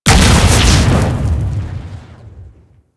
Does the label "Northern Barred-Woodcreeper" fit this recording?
No